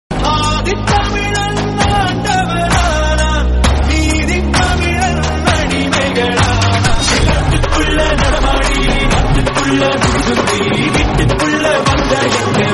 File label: Tamil Song Ringtone; Billa Movie